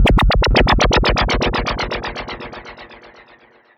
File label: Sci fi